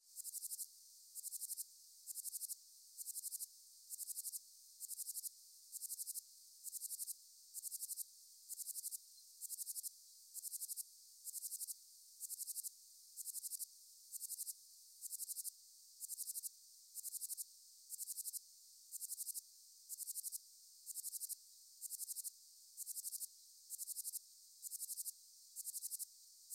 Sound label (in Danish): sandgræshoppe